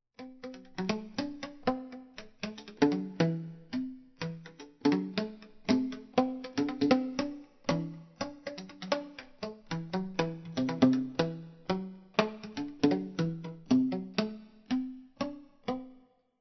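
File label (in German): Luft-Röhren-Trommel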